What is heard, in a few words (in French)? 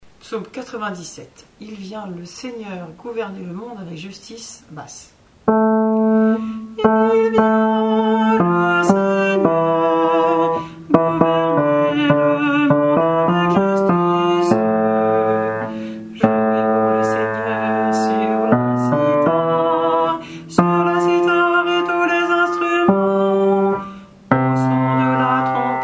Psaume 97 Il vient le Seigneur gouverner le monde avec justice BASSE